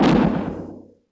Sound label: explode; Heaven Studio Plus; fireworks